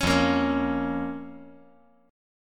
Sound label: F Suspended 4th Sharp 5th F